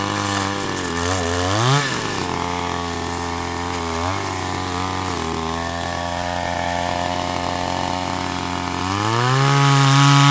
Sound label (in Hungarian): benzinmotoros láncfűrész